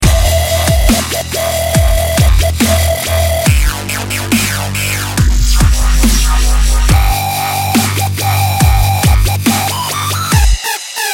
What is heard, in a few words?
dubstep